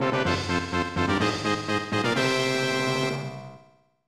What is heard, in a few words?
Fanfare; Wonder Project J 1994 SNES